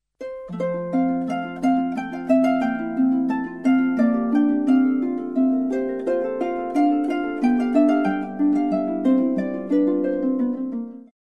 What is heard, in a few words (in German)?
spieluhr; PRIMUS